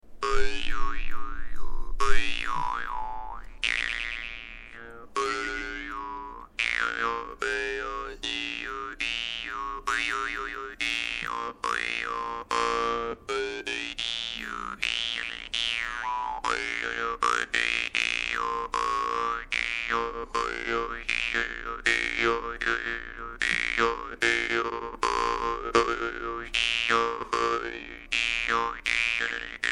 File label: khomus, khakass; khakass volgutov big; vargan